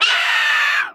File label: Hungry Fledgling Death